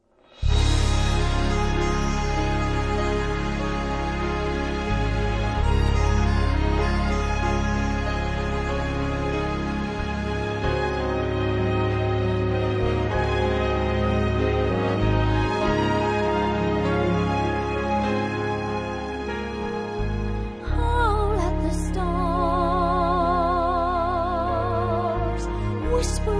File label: whistle; Whistle Down The Wind